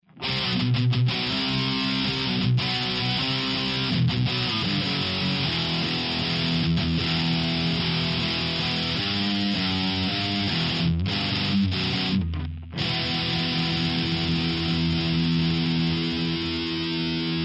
L6 spinal puppet

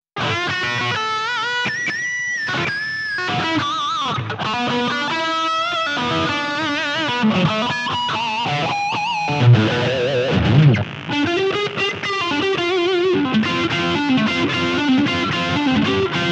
Green Machine lead

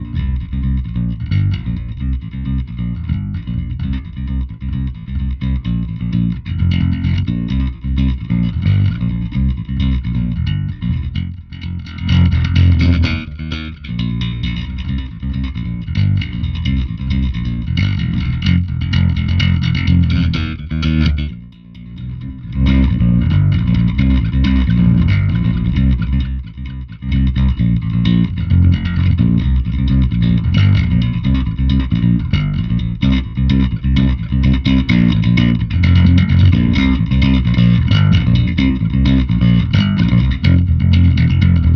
bass amp, bass test

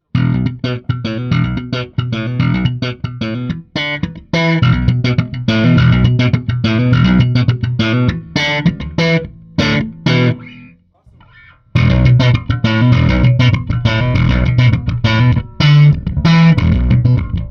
hiwatt, bass

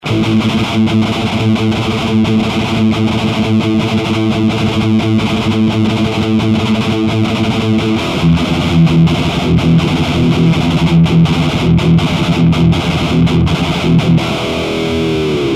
impulz

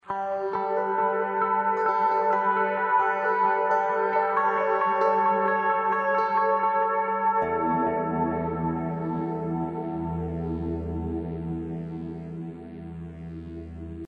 meditation

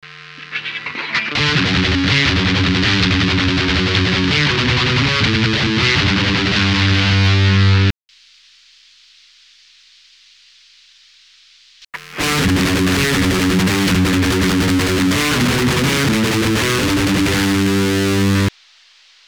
speaker sim

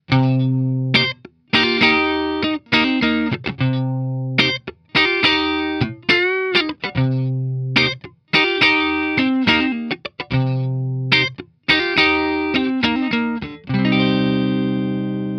ac standard, tele

